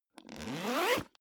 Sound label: zipper backpack open accelerate